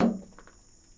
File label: perc